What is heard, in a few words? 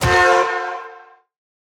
Toy Hammer Hit